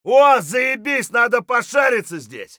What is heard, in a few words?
loot container; Escape from Tarkov